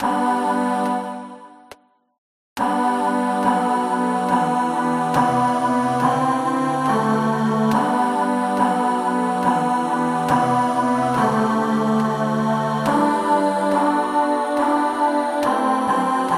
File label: Ave Maria Ténor